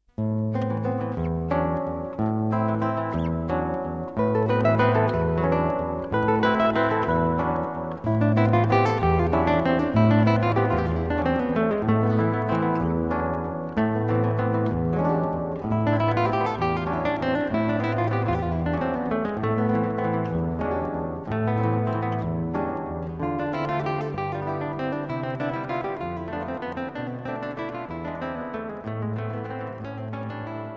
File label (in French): VALSE; DES TROGLODYTES